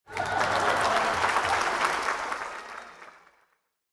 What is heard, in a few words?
Crowd Cheering